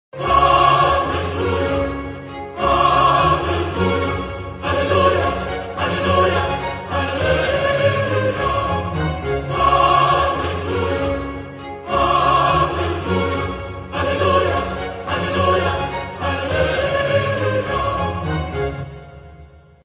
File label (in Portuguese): som de aleluia aleluia